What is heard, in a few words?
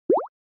OS Sound Notification